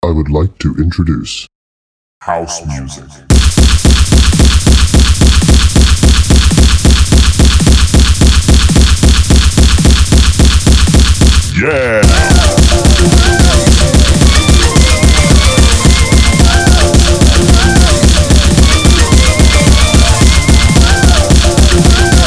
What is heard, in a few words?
house music, gabber music; jumalauta gabber committee